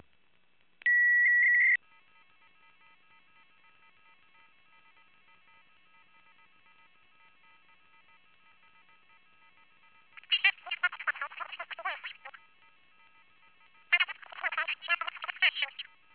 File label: TCC DSP 9000 / VOICE CRYPTO